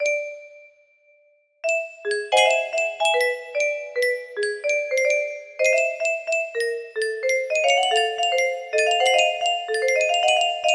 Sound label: melody, music box melody